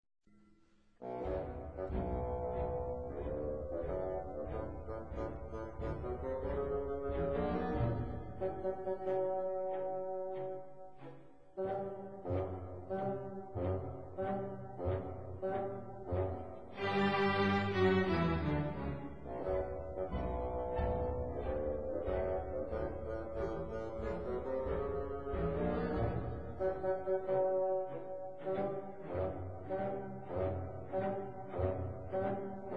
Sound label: bassoon; western